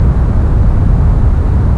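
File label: water pipes; ambience; XDM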